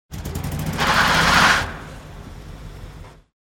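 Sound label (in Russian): вращение колес на месте в гулком помещении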